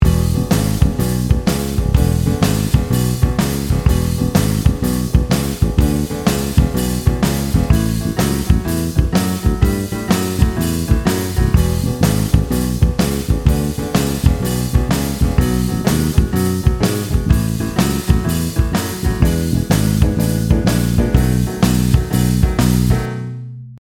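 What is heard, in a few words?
Walkup, bass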